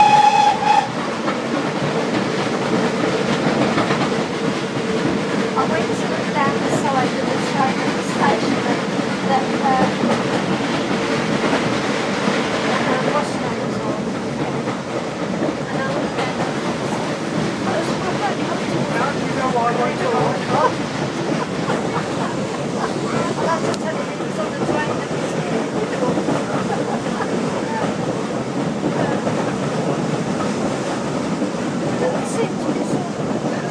br standard 9f leaving; ropley

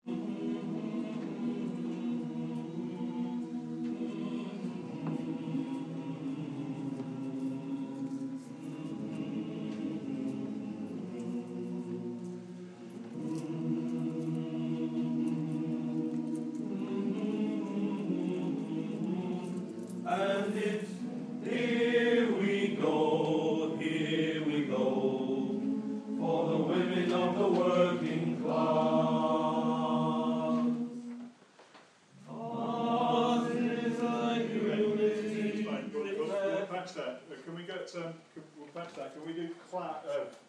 rehearsal of male voice choir; saddleworth

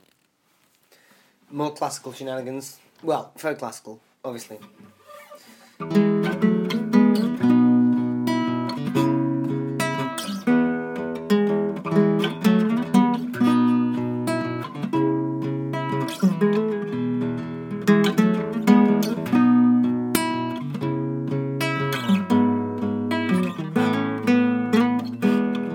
excited vintage guitar shenanigans

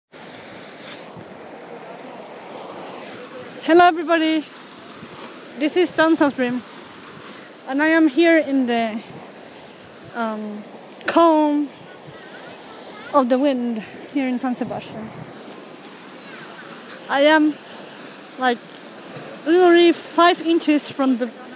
sea waves

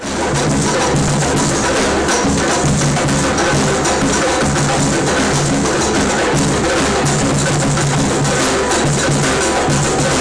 drumming rehearsal for notting hill carnival

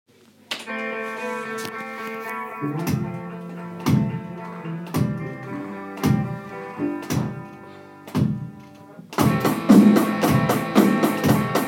4 4 rock beat

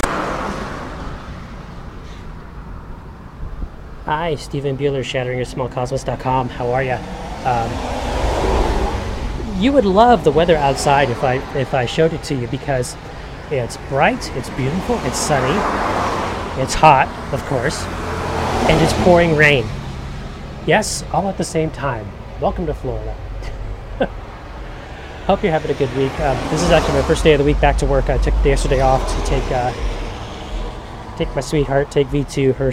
bright sunny and pouring rain

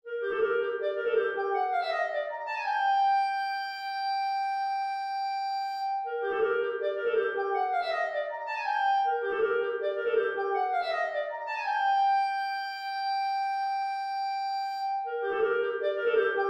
short clarinet solo

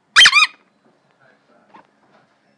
horn

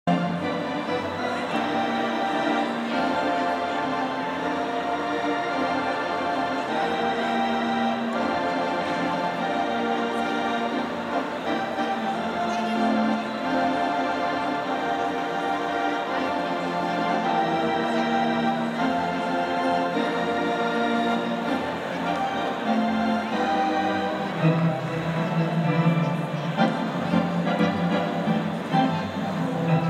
pre game organ at the ice hockey; nyislanders barclayscentre nhl

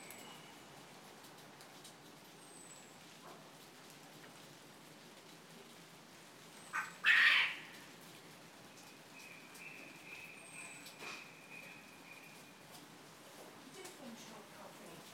quail squawk

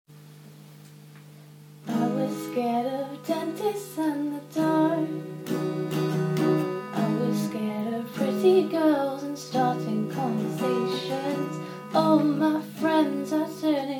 solo performance w guitar

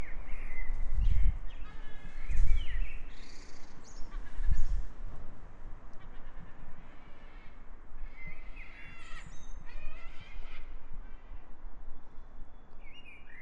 bird outside cathedral at dusk

